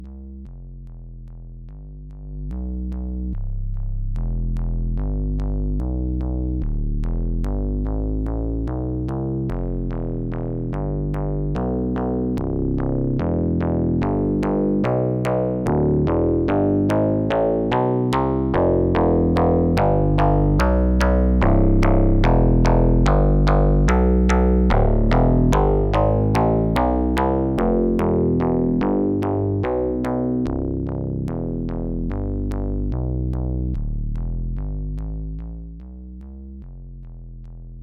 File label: sine tri fold; cre8audio West Pest